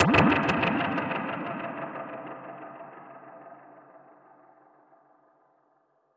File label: Perc Hit, dub percussion; musicradar